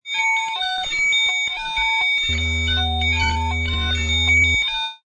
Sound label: Glitch, Digital, Mechanical, Robotic, Computer Malfunction; Multimedia; Warped